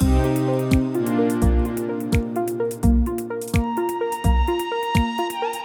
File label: Triplet Vibe, Loops; BB 2HTC Samples Vol 4